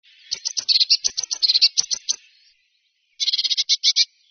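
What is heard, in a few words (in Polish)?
modraszka nawoływanie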